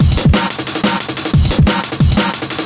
DRUM LOOPS and BREAKS, 180 bpm; 161 to 180 bpm; taste my pain

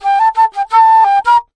fluffy flute drama; MELODIC SAMPLES

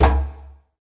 djembe big hit; ETHNIC and WORLD PERCUSSION

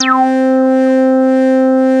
Synthesizer Middle C Notes, Lead; instruments multisampled; synth keys

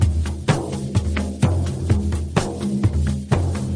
thuddy african beat, drum loops and breaks

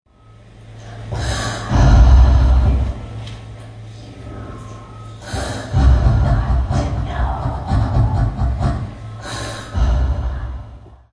breathy breath, female; MISC and SPOKEN WORD

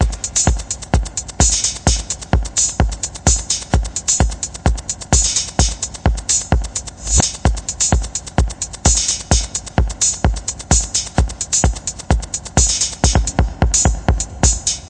129 bpm verby lofi drum machine

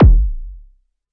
Arturia MiniBrute kk

